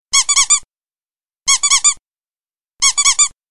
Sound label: 3x Rubber Duck